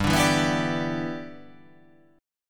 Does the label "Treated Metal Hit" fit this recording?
No